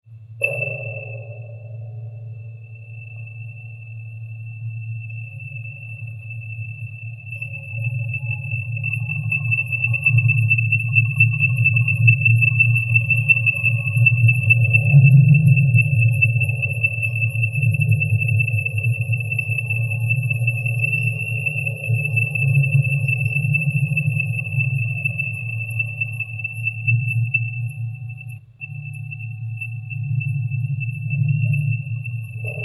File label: Percussion; Percussion-Improvisation; 12. Einspielung, Rudolph-Steiner-Schule Salzburg; Der Kopf macht die Musik